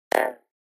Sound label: Pop Up Hollow Pops Rev, pop up element